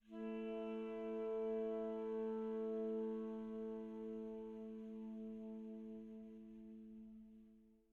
Env Sky Low Altitude Melody Day; gamerip; The Legend of Zelda Tears of the Kingdom

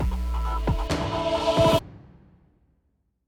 Monster Army

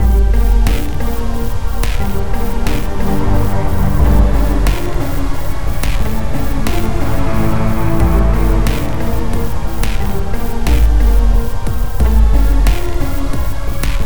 Musik Desierto Exploring

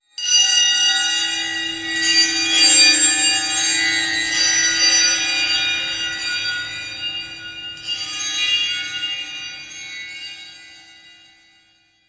environment temple bells; Aragami

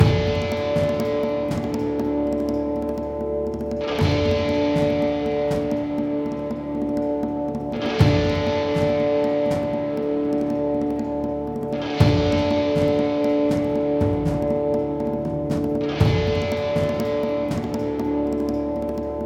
Stinger Plane; assassin s creed chronicles india; Amritsar

